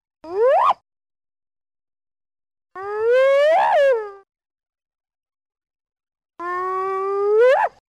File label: hyena; zvuky zvirat